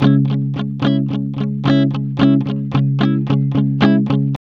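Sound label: ROCK C minor 110, Vintage Blues Guitar; USB Soundscan vol.22; AKAI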